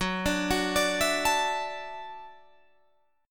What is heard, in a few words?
F sharp Minor 7th Sharp 5th F sharp, Guitar DADGAD